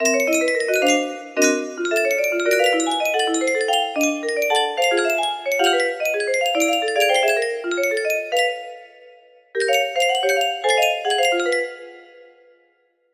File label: music box